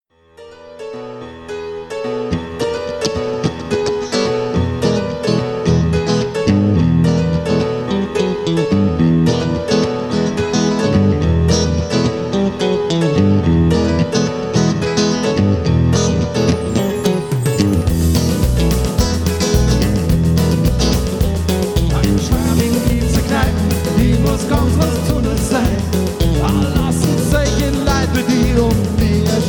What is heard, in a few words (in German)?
Deutsch Rock